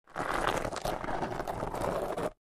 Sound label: Object Dragged Acros, rocks & dirt